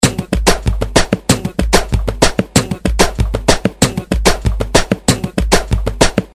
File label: beat; djluisinho